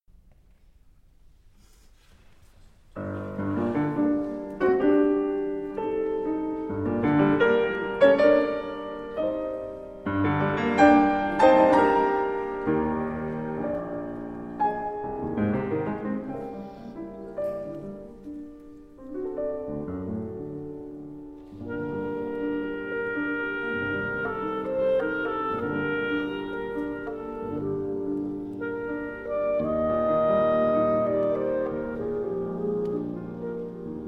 Pieces for Clarinet, Viola and Piano, II. Andante Con Moto